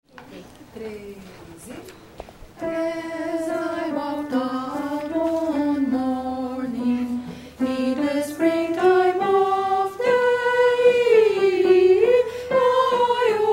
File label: contraltos; Spring time; coral